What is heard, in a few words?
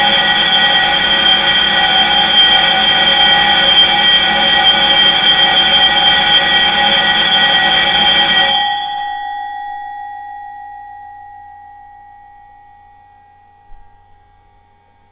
Mechanical School Bell; 15 seconds